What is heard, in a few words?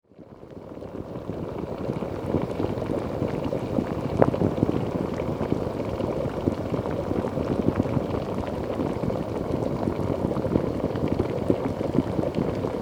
paint pots